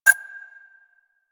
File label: Hub Concourse Computer Beep